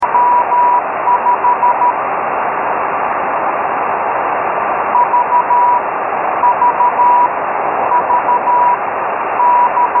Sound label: 6m beacon; N0KP/B; 50.073; Shakopee, MN; Morse code repeating